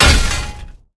metal heavy chop metal

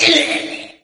pain right arm; doom3

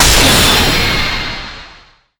crystal soul spear explode

